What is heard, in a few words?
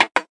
TIMER